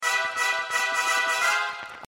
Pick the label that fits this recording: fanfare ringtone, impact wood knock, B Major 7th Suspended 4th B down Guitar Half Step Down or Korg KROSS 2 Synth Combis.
fanfare ringtone